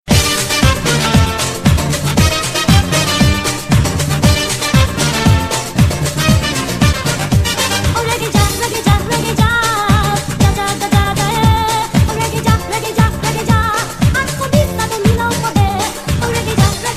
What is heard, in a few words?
Reggae